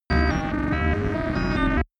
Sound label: space computing astronaut